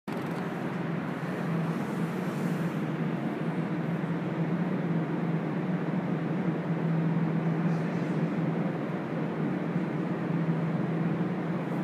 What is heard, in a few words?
R Train Subway